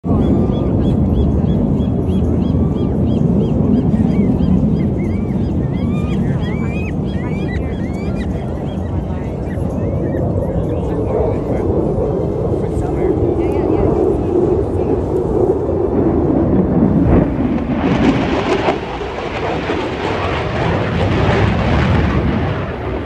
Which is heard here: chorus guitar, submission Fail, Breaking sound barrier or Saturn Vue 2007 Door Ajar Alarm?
Breaking sound barrier